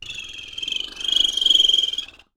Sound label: BIRD CA, JUNGLE SOUND; Voice Crystal - Steve Reid Definitive Percussion